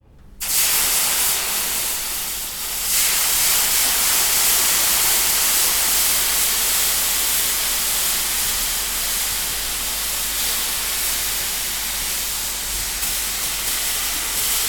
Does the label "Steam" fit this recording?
Yes